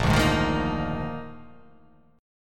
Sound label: A sharp 11th